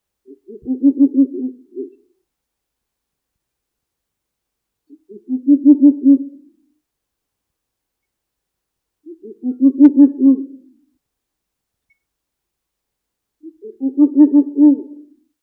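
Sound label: Ural owl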